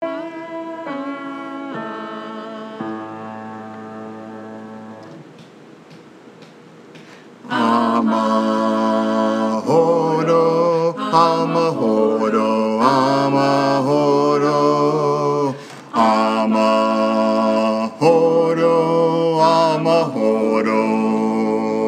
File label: Bass, choir